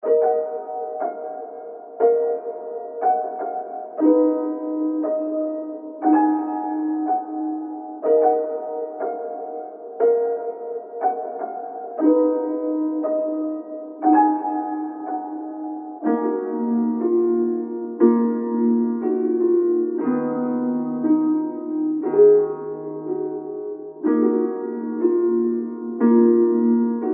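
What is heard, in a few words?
60bpm Bb min, melody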